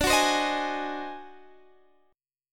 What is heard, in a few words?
D 7th Flat 9th Sharp 11th; strummed; JGuitar